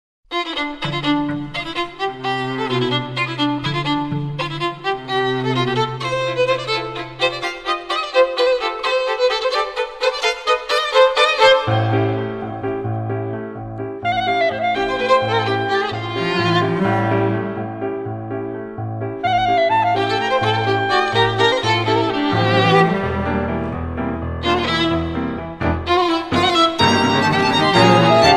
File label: Folk Quentet